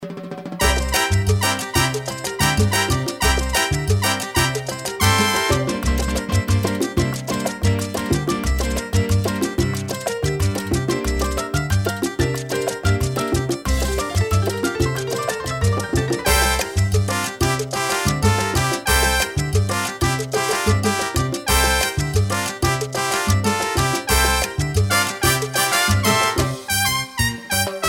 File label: mambo